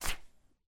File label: page turn